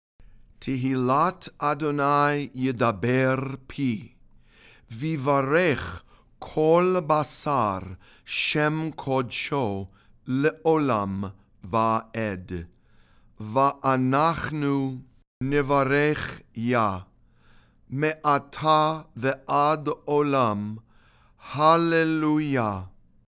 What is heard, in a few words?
ashrei read and sung